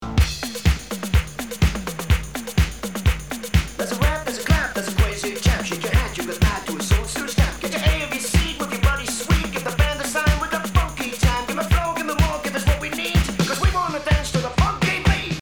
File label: rap clap